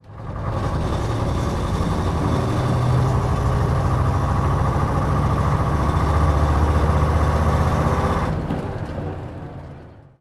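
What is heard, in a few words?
leyland titan pd2, bus; Simutrans; Pak128.Britain-Ex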